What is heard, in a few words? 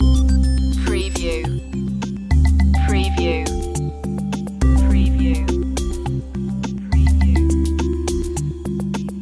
loop, chill